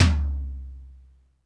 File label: DRY T, drum; Crypton - DrumBase5000; DRY KITS